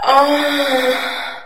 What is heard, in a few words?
female orgasm